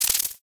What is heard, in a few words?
synth misc, retro synth; retro synth SFX